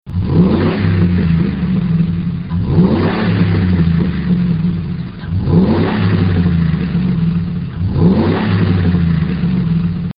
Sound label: F-150 5.4L Dual Extreme